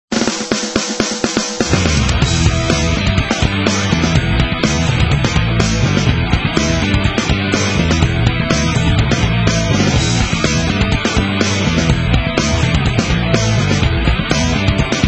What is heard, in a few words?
Slap Bass ver.; Sonic Adventure OST